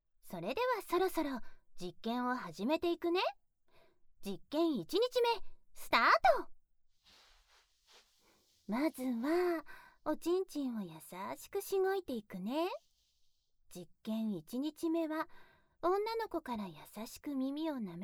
クチュ無し版 優しい耳舐め手コキ; 実験1日目～優しい耳舐め手コキ＆カウパー採取～; ボッキー製薬株式会社

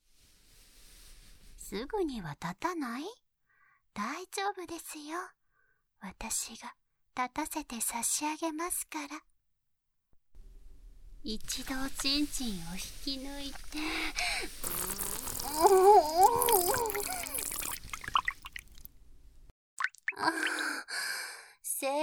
強制勃起フェラチオ＆バキューム 効果音入り, ASMR; シスターが祓う穢れ

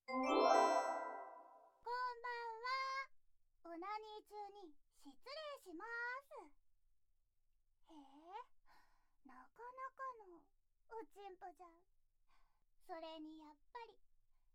耳奥舐め＆カウントダウン手コキ、お掃除バキュームフェラ, ASMR, KU100

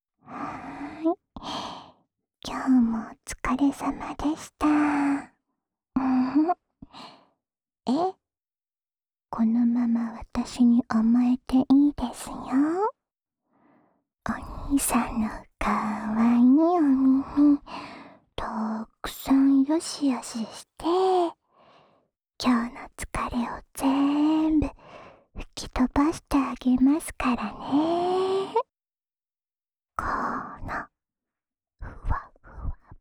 安眠用ふわふわ梵天耳かき（左耳）, ASMR; 耳かきリフレ『春乃撫子』へようこそ♪～至極のロリ店長と、癒やされヌかされ温泉旅行。着床オプション付き!

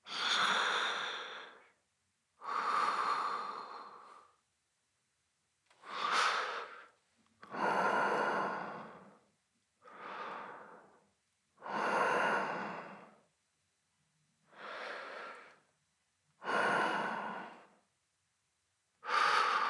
寝息ボイス; 和混血帅气男生的独占舔活契约~要高潮几次都可以哦~

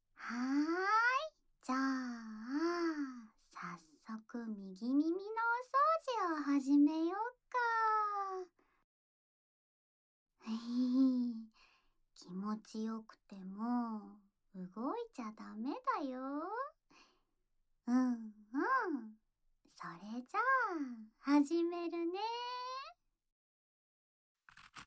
耳かきで右耳のお掃除です！

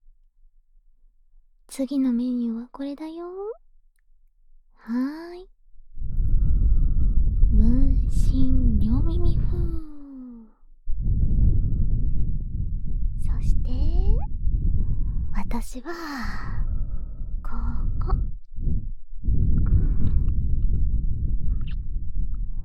両耳 ふーふー ベロチュー 焦らし, ASMR

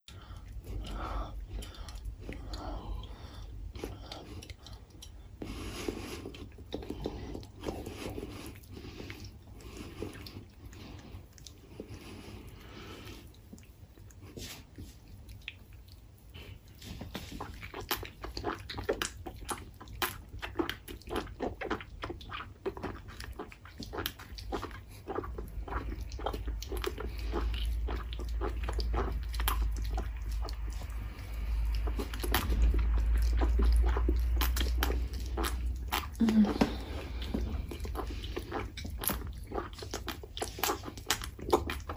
人妻フェラ

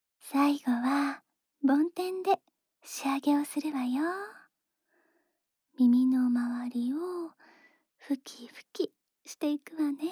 梵天で右耳の耳掃除

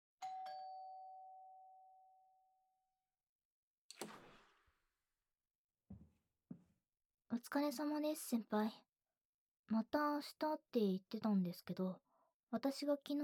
耳舐め, ASMR; バレたので耳舐め素股してあげます

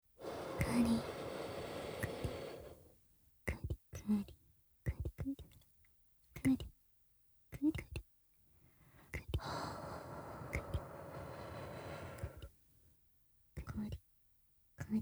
ひたすら乳首弄りループトラック編（乳首息吹きかけ用＋くりくり）; 超初心者のための「3ステップ」乳首開発完全ガイド!～低刺激スローチクニ―から♀イキまで～

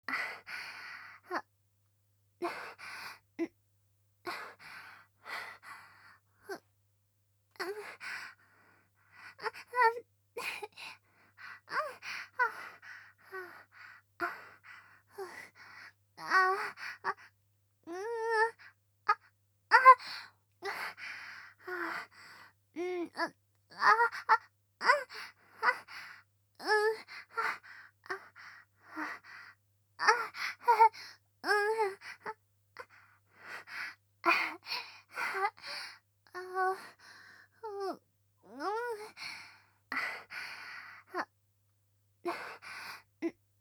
喘ぎ 普通; 性欲が強すぎる淫乱メイドのご奉仕ハーレム《夏休み(エクストラサマー)!!》

